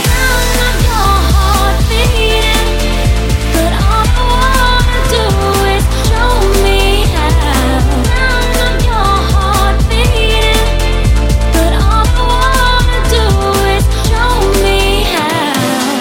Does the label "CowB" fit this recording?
No